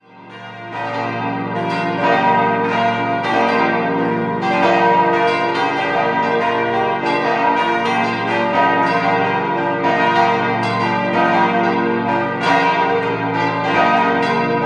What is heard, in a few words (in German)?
Glocken, Mühldorf am Inn-Altmühldorf, Pfarrkirche St. Laurentius; 1929; Kempten; 1627; Firma Ulrich; Bernhard Ernst